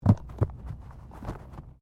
Body movement Fall Medium Double; Body movement foley to simulate falls and deaths; Wrestling And Struggling

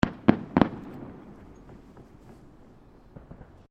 Fireworks Multiple Firecrackers; Fireworks (Sound Effects:Backgrounds); Crafted Signals

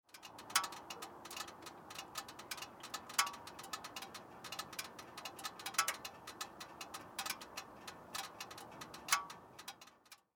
Car Mercury Sable Hot Engine Clicks; Power Car; LYN110 package of Power Car sounds; Car Sound Effects

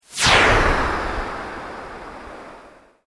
Sweep Organic Medium

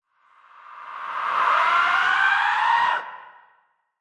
Ghost Scream, Ghost scream, high pitch; Horror

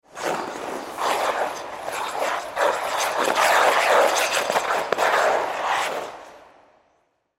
Ice Skate Spin, Ice Skate Spin Twirl Rink; Ice Skating; Sports; SoundEffects-Blastwave

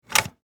Foley Door Hotel Key Card Insert; Miscellaneous Doors; SFX Bible

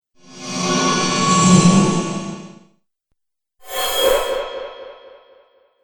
Magic Spells; Magic Sound Effects

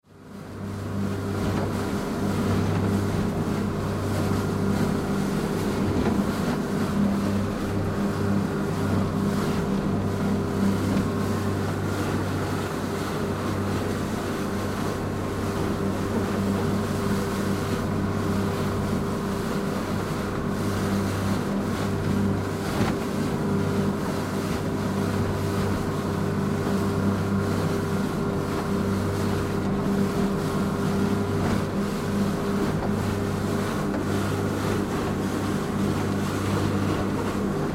Yacht Drive Interior Cabin; Transportation Watercraft

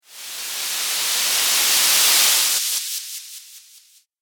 Static Slide And Flutter Whoosh

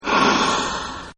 BREATH, Breath Winded Female; Breathing; Human

